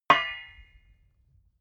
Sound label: metal impact pipe; hits explosionen; metall